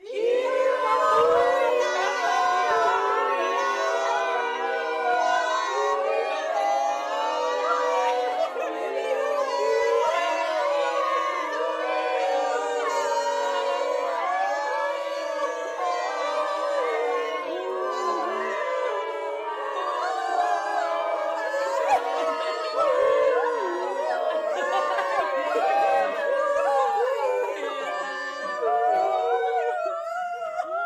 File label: choir high; meeting choir; finearts